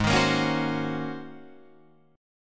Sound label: Ab 11th Ab Guitar Drop D; strummed; 6,6,8,6,7,8; JGuitar